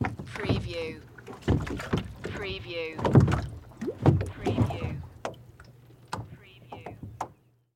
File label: Kayak Step Into Boat; Sound Effects Bible